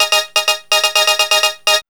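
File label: tek chord; X-Static Goldmine 1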